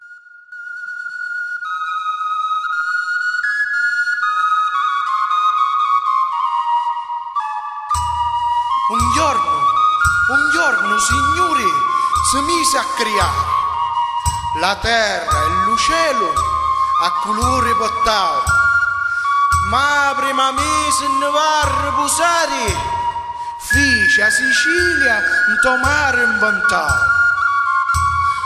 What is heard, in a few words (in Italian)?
musica Canzone Araba